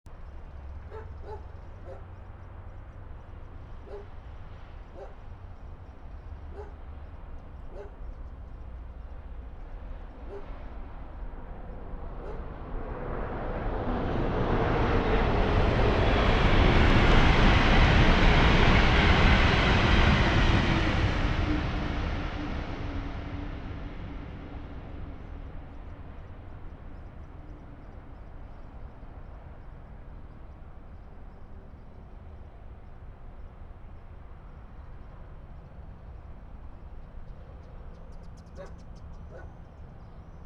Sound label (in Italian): Treno; Eigenmike; ComunePR; NuovaStradaF